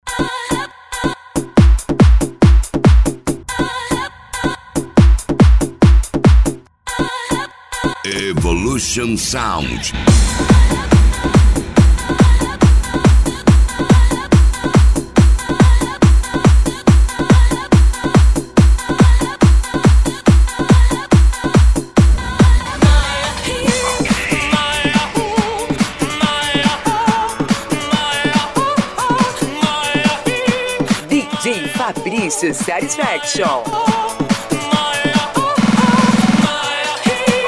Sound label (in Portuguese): esp de pancada